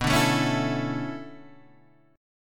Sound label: B Minor 11th B, Bm11 chord; strummed; JGuitar